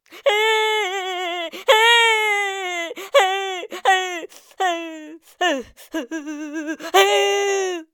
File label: Cartoon Despair Crying